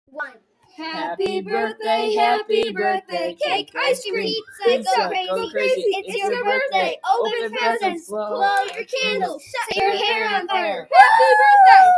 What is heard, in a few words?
crazy birthday